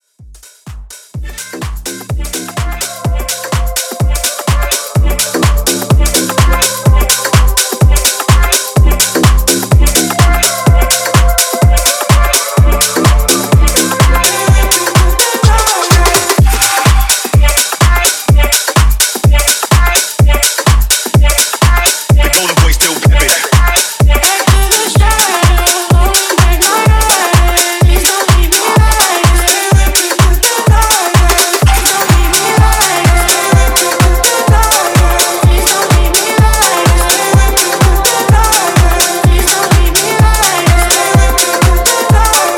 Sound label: Top40s House ReDrum Dance 126 BPM